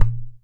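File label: IPU GOURD, HAWAII PERC; AKAI S6000 CD-ROM; WorldSounds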